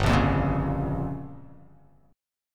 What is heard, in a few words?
G Minor 7th Flat 5th